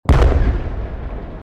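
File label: big explosion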